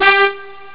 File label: brass; jazz jackrabbit 2